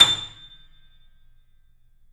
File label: A, The Piano; InVision Interactive - The Piano